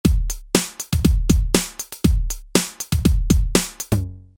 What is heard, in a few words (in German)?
Loop ohne Filter